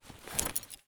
rgd draw; Escape from Tarkov

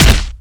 melee punch; weapons; Modern Warfare

